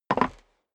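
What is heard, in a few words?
step boots wood creak jump, footsteps; schritte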